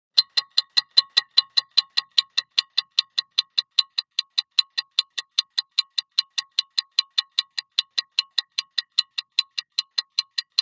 CLOCKS STOPWATCH: INT: Ticking, tinny, metall

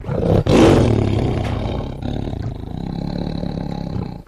Tiger Roar Big Scare; big cats

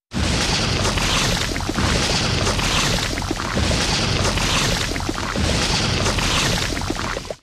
Dinosaur footsteps in mud; animals wild dinosaurs

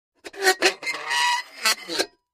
Metal Scrape On Metal

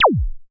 laser; lasers

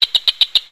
Frog Cricket Chirps

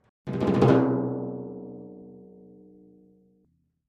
Timpani Hands Speedy; mallets timpani

